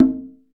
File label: percussion congas